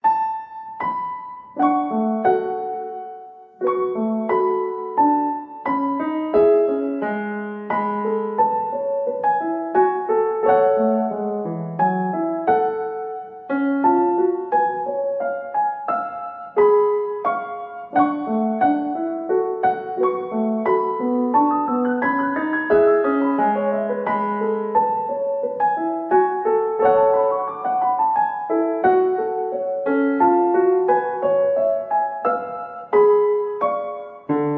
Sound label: music box piano Loop